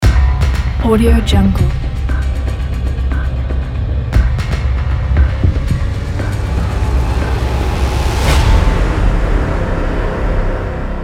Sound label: dark cinematic logo; manfightermusic